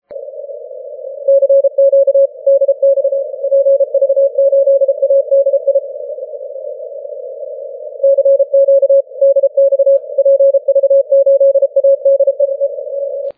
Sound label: 6m cw